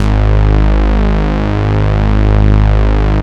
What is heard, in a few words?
Bass Cuts; Vengeance Samples; VES1 Instruments